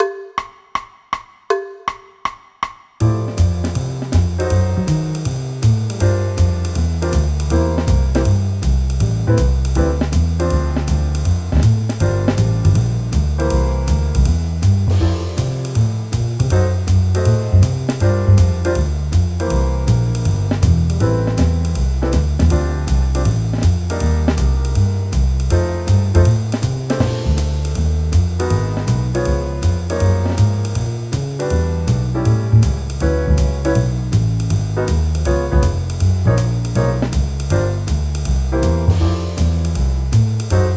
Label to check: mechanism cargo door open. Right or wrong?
Wrong